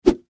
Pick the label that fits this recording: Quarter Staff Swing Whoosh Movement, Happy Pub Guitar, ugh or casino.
Quarter Staff Swing Whoosh Movement